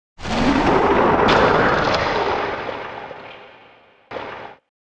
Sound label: ghost die